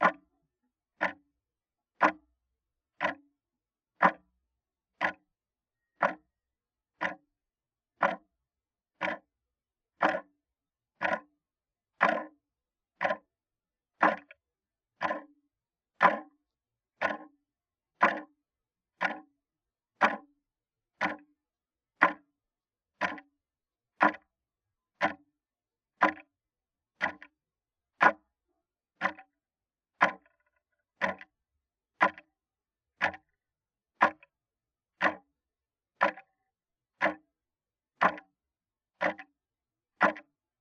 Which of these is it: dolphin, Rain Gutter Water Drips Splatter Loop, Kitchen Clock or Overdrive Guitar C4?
Kitchen Clock